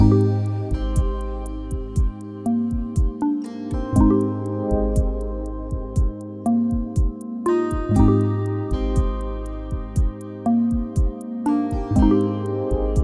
Super Chill, Loops; BB 2HTC Samples Vol 4

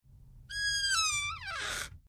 Creaking Door; horror sfx vol 1

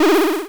energy, square waveform; Square Waveform sfx

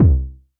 Kick; Sample Growing Stream 002

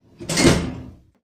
metal

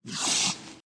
slide

